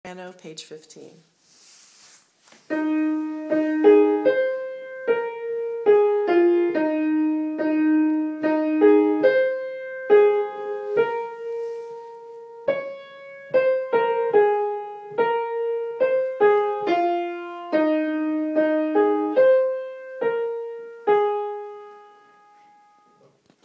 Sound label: soprano